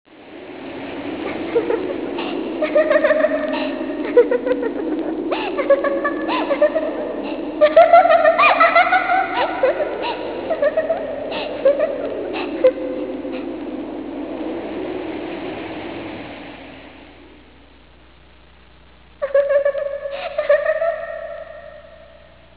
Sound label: laugh, anime